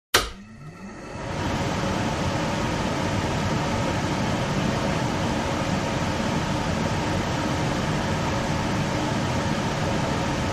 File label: Air Conditioner; Extractor Fan / Air Conditioner On, Run And Off.